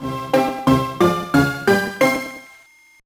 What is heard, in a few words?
Victory; Spyro Orange The Cortex Conspiracy; Spyro Fusion; gba